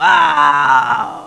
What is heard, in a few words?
death